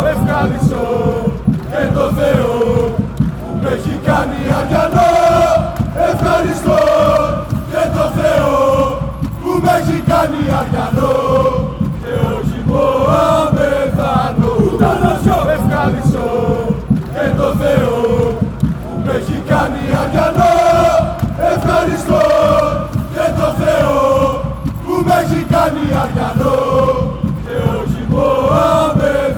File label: chant